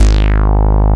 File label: SYN STBAS; Sound & Vision - Gigapack I CD 2; JD 800/990